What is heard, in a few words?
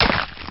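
player hit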